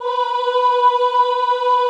OHH B3, Classical Choir; Best Service; Extended Classical Choir

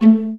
VA PIZZ A2, Orchestra; Best Service; Peter Siedlaczek's Advanced Orchestra

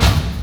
PERC FX, Dance Drums

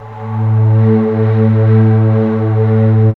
BAMBOO; X-Static Goldmine 1

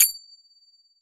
Sagat Open; Cymbals

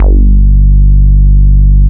FILT BASS; Big Beat Samples & More

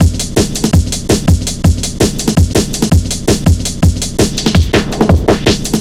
165, Drum Loops, Drum & Bass

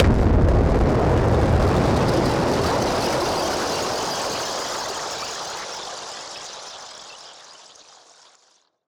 Noise Bomb Harmon; musicradar; bomb factory

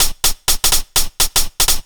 125 DRM, Fresh Disco House

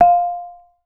BURMESE G, BURMESE GONG; Voice Crystal - Steve Reid Definitive Percussion

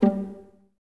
Violas G 3 Pizz; Miroslav Vitous; String Ensembles

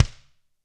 KICK, Drums; ILIO; Double Platinum Drums 2

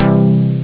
jump; twintris; ST-XX Amiga Soundtracker Sample Packs

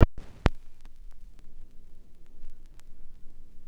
Fx Vox; Equipped Music; Loungin House

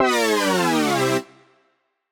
Poly Chord Hits, Ramp Down, C; JPEGG; future rave

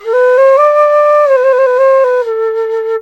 SERULING, Heart of Asia

